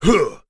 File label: Vox Attack; Bernheim; kings raid; en